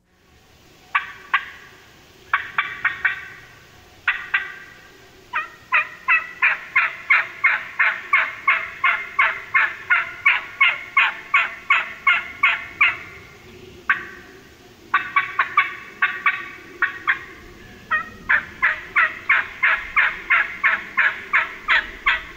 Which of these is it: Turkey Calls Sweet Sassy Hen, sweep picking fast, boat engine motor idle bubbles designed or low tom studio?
Turkey Calls Sweet Sassy Hen